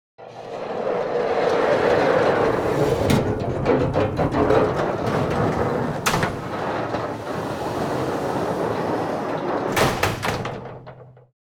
Aircraft hangar door opening top rails perspective